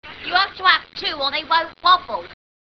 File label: wobble